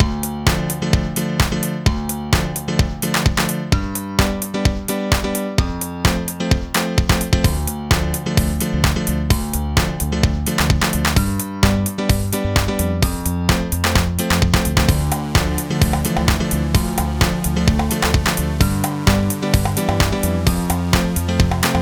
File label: Baleric, 129 bpm, Old Skool House; Big Fish Audio; Construction Kits